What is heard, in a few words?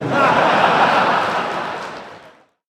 Massive 800 Men Laugh